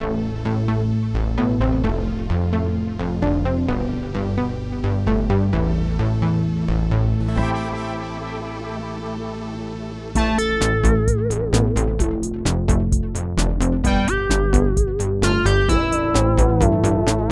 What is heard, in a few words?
Synthepop; Synthpop